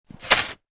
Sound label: shovel into snow